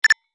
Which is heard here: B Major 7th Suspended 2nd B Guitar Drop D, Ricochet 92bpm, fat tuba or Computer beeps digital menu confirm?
Computer beeps digital menu confirm